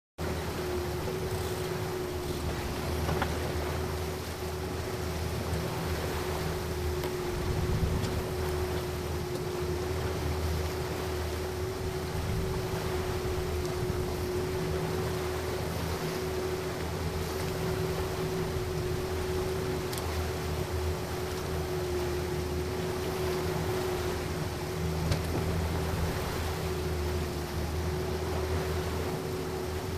Fishing 350 Chevy Mo

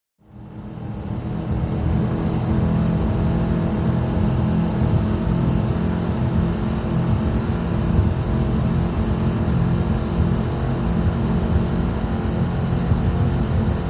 Power Boat Int Racin, off shore power boat

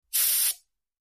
Short High Pitched, air

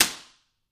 Belt Hit Slap Flat

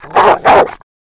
Rottweiler Vicious

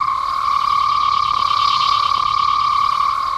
Spidercraft Pulse, starship

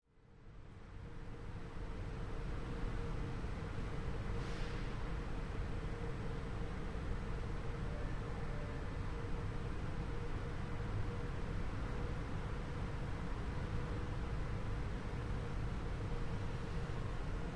Subway Train Fan Hum, subway

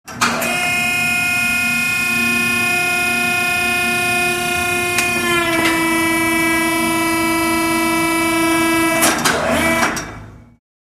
Large Servo; Large Electric Motor; On / Buzzer; Buzzer In Foreground / Hum In Background / Off, Close Perspective.

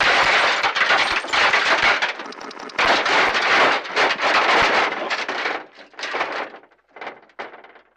Metal Nuts Bolts, small impacts

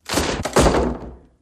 Tree Bends Breaks; trees

